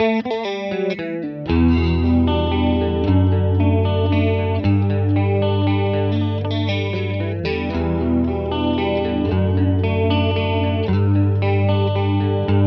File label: guitar, chorus; cosmic milk